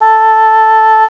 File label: BASSOON, REEDS; CMI Sound Library Rev 1.3